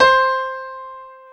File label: PIA PRO, PIA GRAND; Sound & Vision; Gigapack I CD 2; PIA GRAND 1; PIA Grand 3